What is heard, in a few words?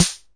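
Vox AC1 RhythmVOX snare drum; drum machines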